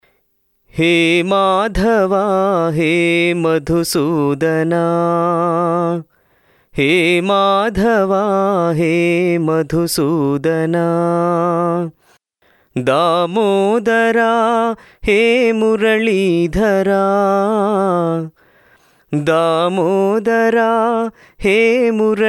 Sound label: TUNE; Sai Bhajans; HEY MADHAVA